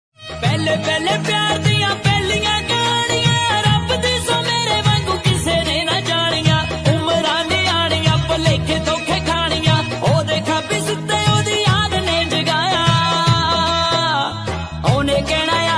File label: Popular Punjabi Ringtone